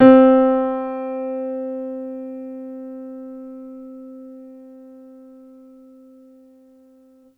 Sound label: Piano Grand c3 med; TrashLibrary